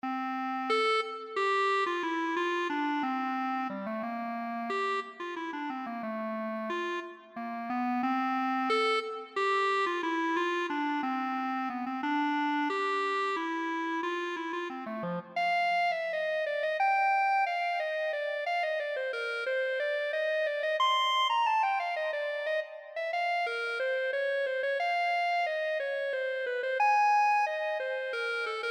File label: Hemiola Waltz Clarinet